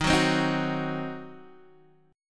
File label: Eb Minor 6th, Guitar Open D